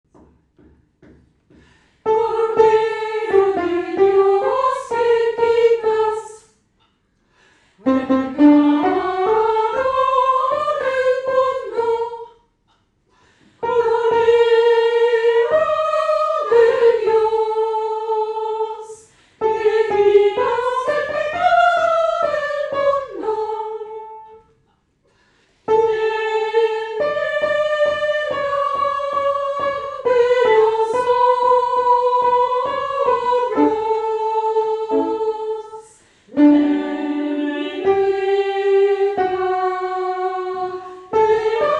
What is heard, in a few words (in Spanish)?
Cordero De Dios Sopran